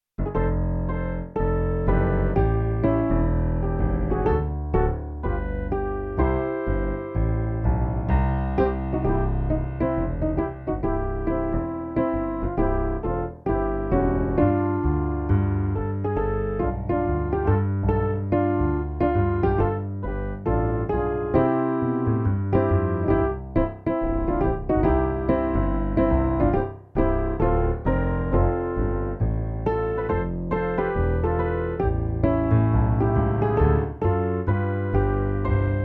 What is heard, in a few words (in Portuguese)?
playback hino